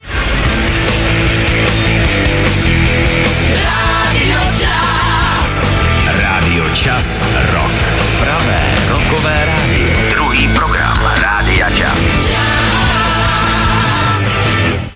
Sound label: station ident rock